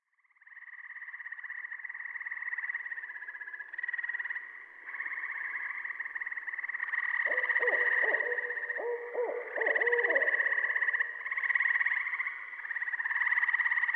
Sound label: Gray Treefrog, Saw-Whet Owl, Barred Owl